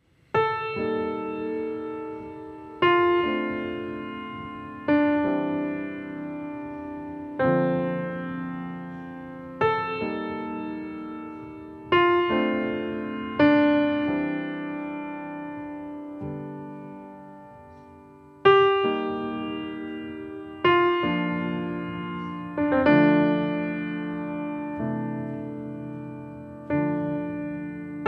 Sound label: little piano